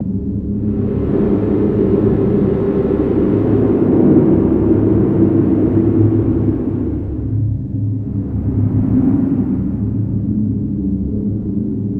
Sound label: Cave Drone Ambience